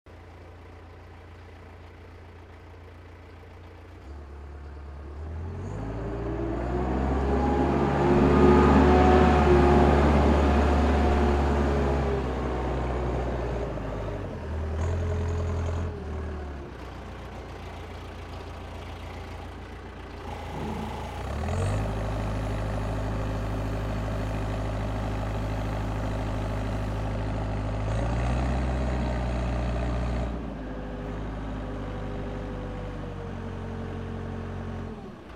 Digger

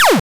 8bit Laser

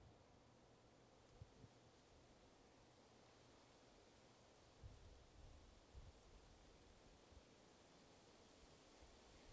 Gentle Wind

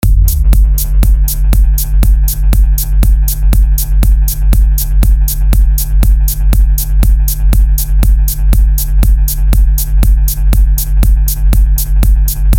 Drumbeats Melodic Deep Steady Beat

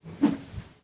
Swoosh

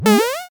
Jump

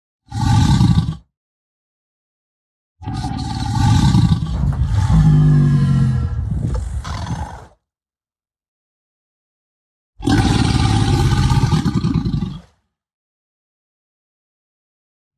Crocodile Dinosaur Bellowing Growl

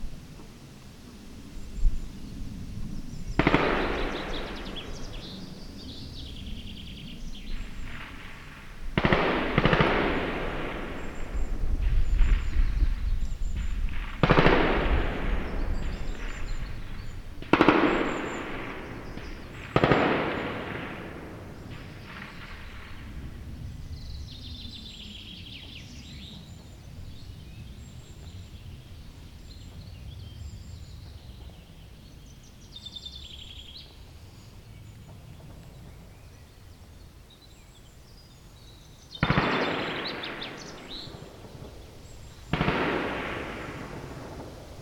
Gunfire; Kootwijk

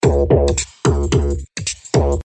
Roadroller beat flange

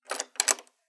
Door Handle

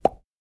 Mouth Pop

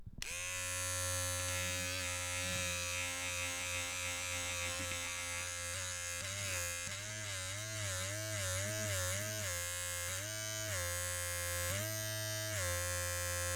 Electric Buzz

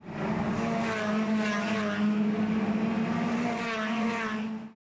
Racecar

